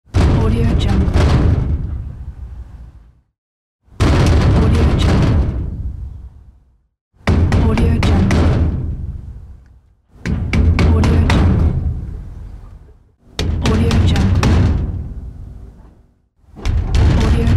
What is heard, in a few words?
iron gate